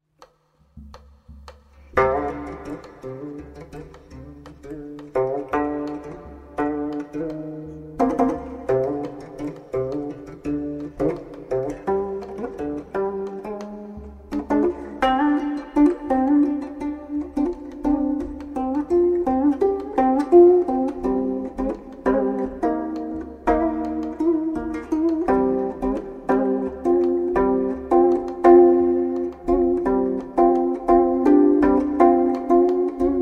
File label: gayageum & jang; gayageum & janggu 산조 가야금, 장구